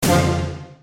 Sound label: stop bonus; Flying Dutchman